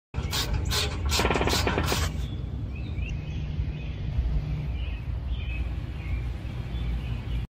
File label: car jack; بالا پایین رفتن; جک ماشین